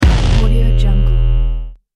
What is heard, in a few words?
distortion impact